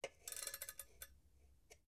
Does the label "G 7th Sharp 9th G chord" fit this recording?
No